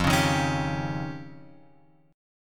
F 7th Suspended 2nd Sharp 5th

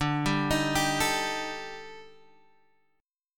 D sharp Major 7th Flat 5th, D, guitar chord

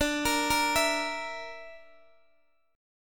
D Suspended 2nd Sharp 5th D, Guitar, DADGAD; Dsus2#5; arpeggiated

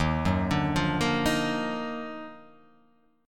D sharp Minor Major 9th; chordshape

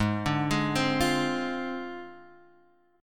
Ab 6th Flat 5th down Guitar Drop D; arpeggiated; JGuitar

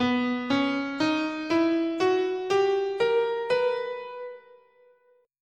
B Harmonic Major

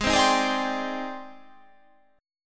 G sharp Major Sharp 11th, chord

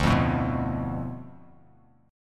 D Minor 6th Bass Standard; Dm6 strummed; JGuitar

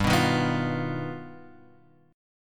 G Augmented 7th, chord; G+7 Chord {3 2 1 4 X 1} strummed

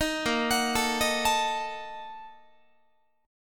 Bb-Major 7th Suspended 4th Sharp 5th, Guitar; arpeggiated

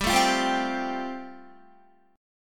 G 7th Suspended 2nd Suspended 4th, Guitar; G7sus2sus4 strummed

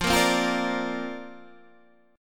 D Minor 7th Sharp 5th, F

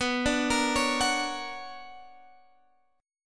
B Minor Major 9th, Guitar, Open D

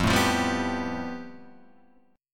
A 7th Suspended 2nd Sharp 5th F, chord

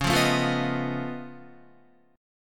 C sharp Augmented 9th C sharp Guitar; strummed; JGuitar

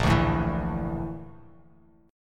Bb Major Sharp 11th, Bass Standard; strummed

